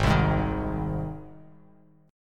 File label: G sharp Major G sharp Bass Standard, chord; G# strummed; JGuitar